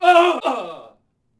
Rfmn Die Vox; Civ4

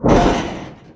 pull sheet metal

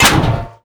connect container

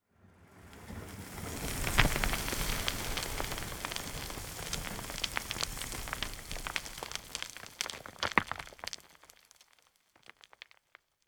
ambient; World of Tanks

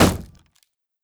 door impact wood; Rust

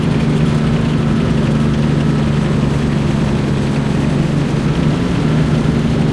tank engine int rpm 66 load; engines; War Thunder

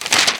paper grab; Call of Duty Modern Warfare

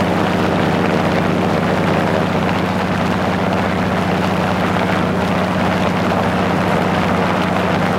engine plane rpm50 mid; War Thunder

